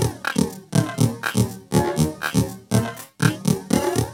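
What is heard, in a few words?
Loop, Techno, Electric Loops; 90s sample CD; Syntec; Wall of Sounds VOL-2; TECHNO-BOX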